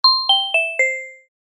場面切り替え ベル

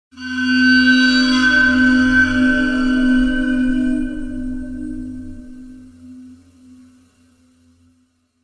怖い金属の音; 場面切り替えや心理描写; 演出効果音

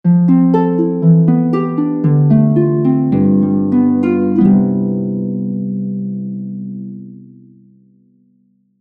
休む 休憩 寝る ハープ モノラル

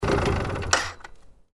原付のエンジンを切る音; 環境音

